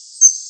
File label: song